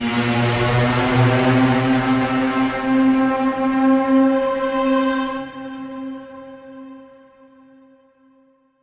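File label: creature; Embia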